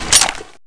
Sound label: player pickup Grenade